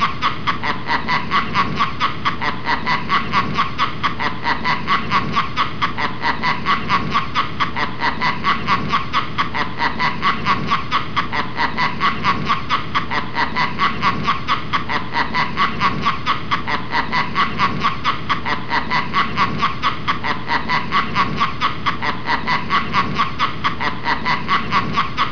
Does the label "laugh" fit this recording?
Yes